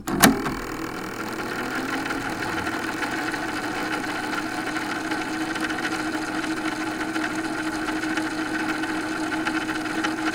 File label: Mechanical